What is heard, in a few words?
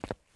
FOOTSTEP Trainers Asphalt Run mono; Phasmophobia